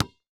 Sports Ball Basketball Dribble On Cement Floor Single